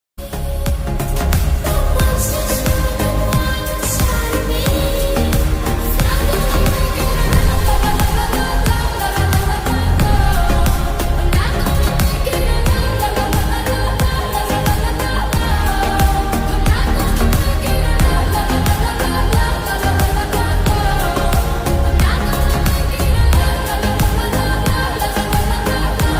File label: BGM